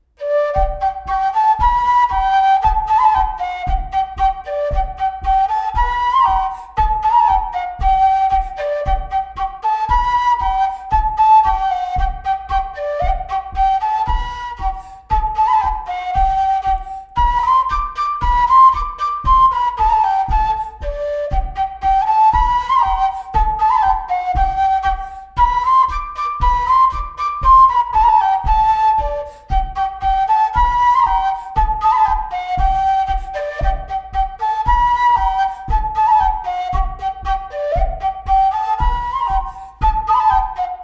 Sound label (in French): Bourree